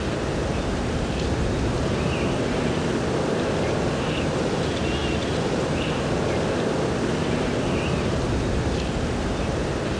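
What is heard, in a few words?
ambience loop; DAoC; Toa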